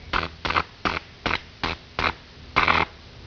buzz; ambience

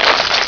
smack; hook; predator

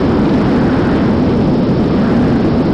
storm

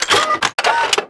gunner idle

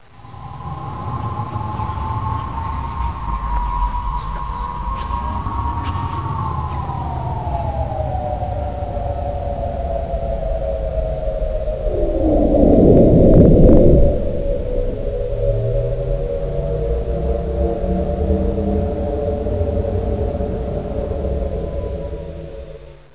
eerie

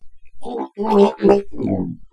DROWN

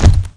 bounce, c4

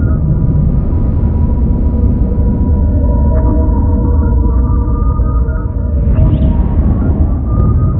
laser; spawngirlsounds; laser x7 temple

